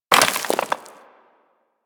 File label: Bullet Impact Debris Rock; Warframe; Weapons